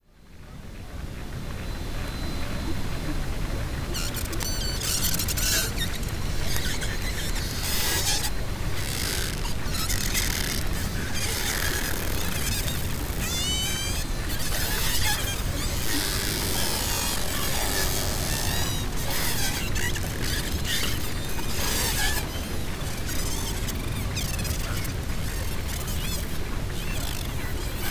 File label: Pacific White Sided Dolphins